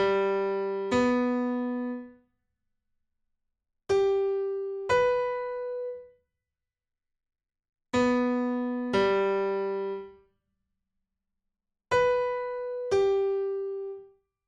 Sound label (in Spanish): Intervalo tercera mayor